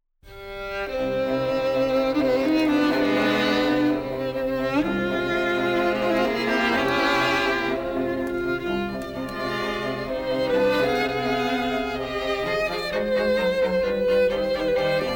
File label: strings; beethoven; bakuage 3db